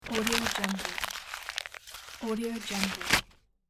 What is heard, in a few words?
paper foley paper sheet smash